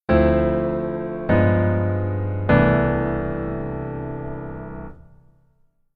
keyboard voicing low

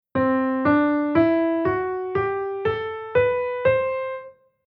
Chord mode C Lydian

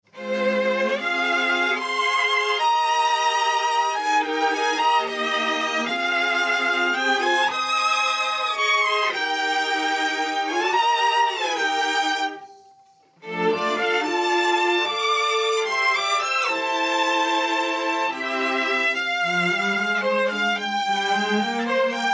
Strings 1st Comp On

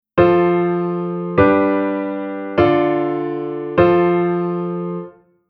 Chord S S D S S

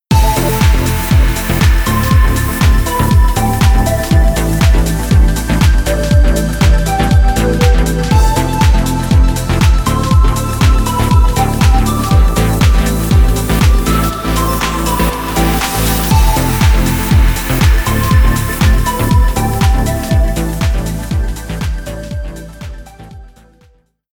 Synth

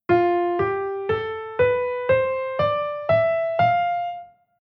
Chord mode F Lydian

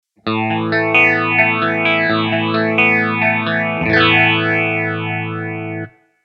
guitar Phaser

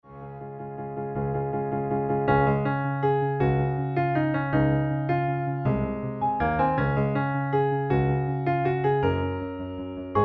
melo chord

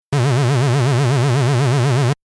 synth lfo rate

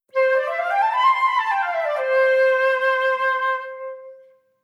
scale

